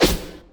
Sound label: punch